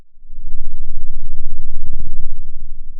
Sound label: Sound of a thunderstorm, center